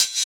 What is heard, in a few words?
Hhat, Hihat; Equipped Music; Loungin House